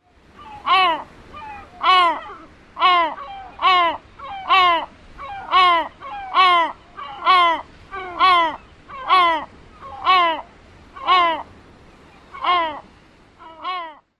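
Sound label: kow calls, bird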